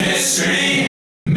Key chant; Luxembourg Zwee

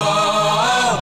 Key chant; Luxembourg Zwee

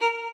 solo

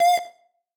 synth

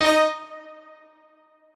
piano

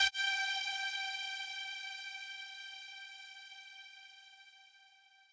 lead

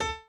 piano chord

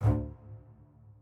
strings

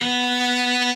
cello1

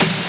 fill

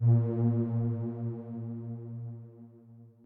bass pad

